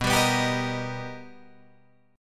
B Augmented Major 7th B, Guitar, Drop D

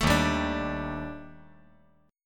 Eb Major Sharp 11th; strummed; chord

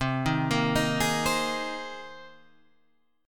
C Minor 7th Sharp 5th C down Guitar Open G

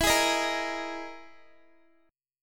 E Major 7th Flat 5th, Guitar; EM7b5 strummed; JGuitar

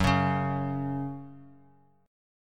Gb 5th Gb down Guitar; Gb5 strummed; JGuitar

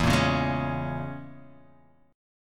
E 6th Flat 5th Guitar Half Step Down

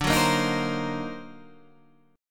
D sharp Minor Major 13th D

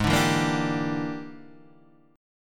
E 7th Sharp 9th Flat 5th G sharp, chord

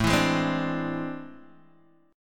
A Minor Major 11th A, Guitar, DADGAD

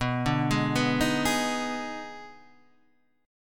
Eb Major 7th Suspended 4th Sharp 5th B

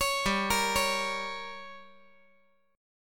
G Diminished

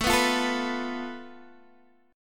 A Suspended 2nd Sharp 5th, Guitar, Open D

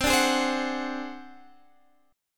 Ab 7th Flat 5th C, chord, guitar

